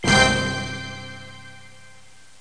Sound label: musc